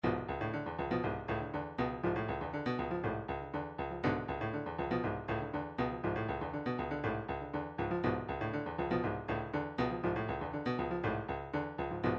drums, midi drum patterns

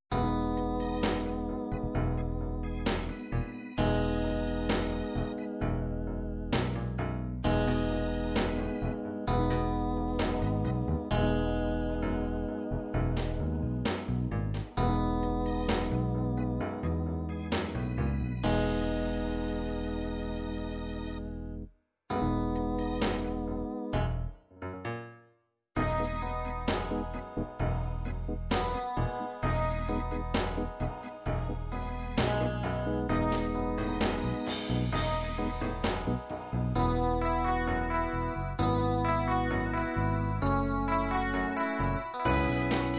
ballads ballad guitar, midi

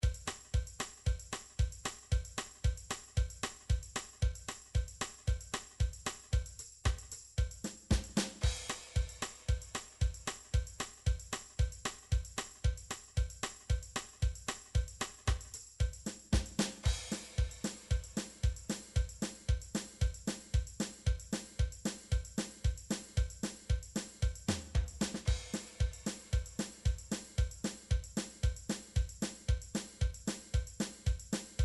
drum midi cajun

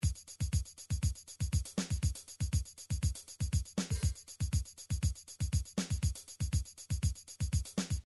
midi drums salsa

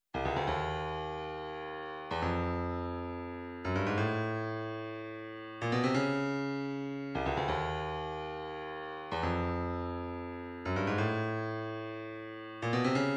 137 wavedrum, midi

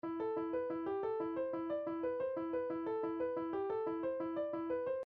tubular bells, midi

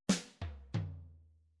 midi file fills 3 4 fill

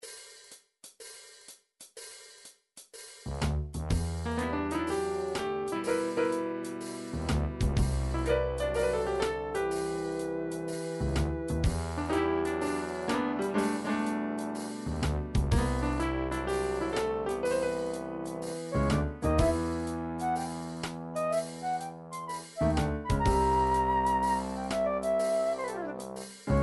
jazz

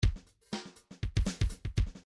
bdf midi file grooves funk misc funk misc

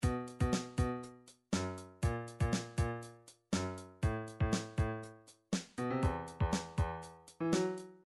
funk, midi

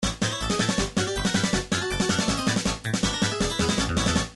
round intro; gameboy wario blast

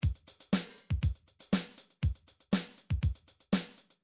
midi drums, funk

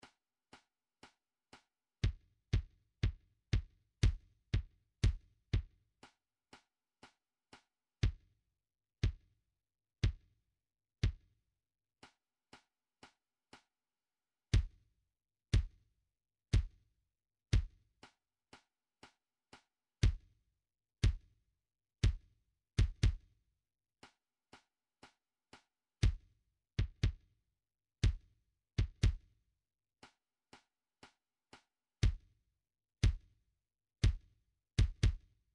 drum trax kick

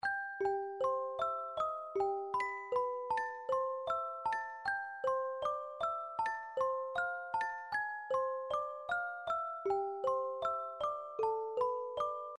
game over; nintendo legend of zelda

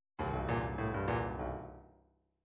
midi fills rockfunk

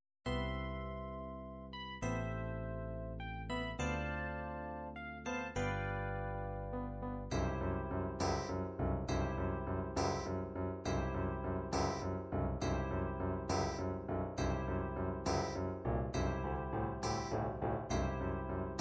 ballad

